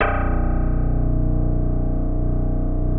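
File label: bass C2; Greytsounds; Scott Peers Sound Engineering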